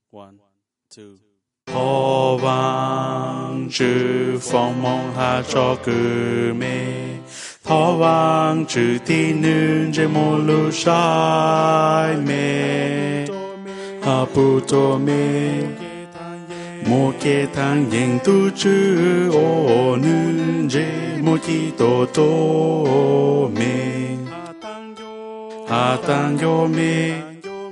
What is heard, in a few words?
alto